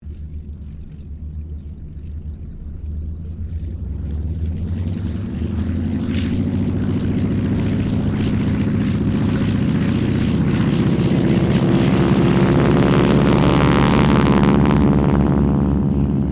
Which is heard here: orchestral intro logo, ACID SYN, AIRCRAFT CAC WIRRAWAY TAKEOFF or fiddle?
AIRCRAFT CAC WIRRAWAY TAKEOFF